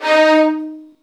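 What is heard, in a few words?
violin; Virtuoso Strings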